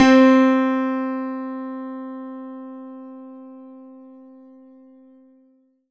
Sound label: roland d 20 rich piano c4